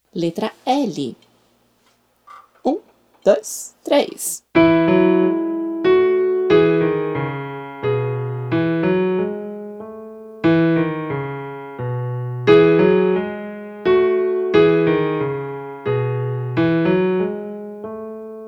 SOLFEJO Melodia Cantar em Eco

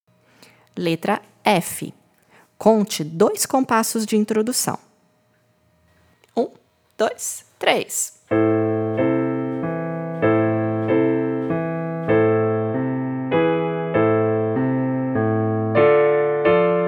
Ritmo Solfejo Ritmico

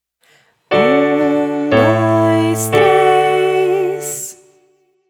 SOLFEJO Melodia Solfejo Melodico

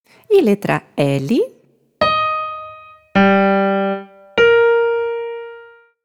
Melodia Contornos Melodicos; PERCEPCAO

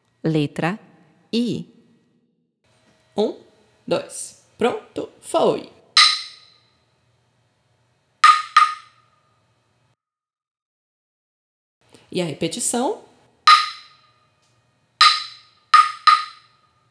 PERCEPCAO Ritmo Semelhancas e Diferencas

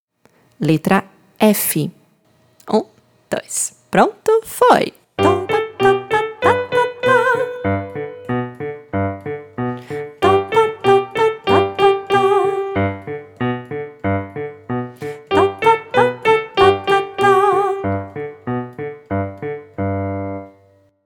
Melodia Cantar em Eco; SOLFEJO

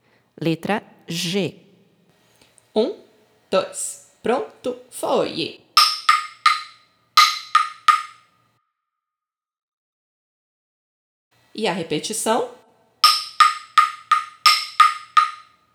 Ritmo Semelhancas e Diferencas; PERCEPCAO

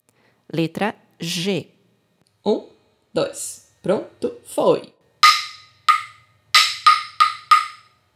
PERCEPCAO Ritmo Correcao de Erros

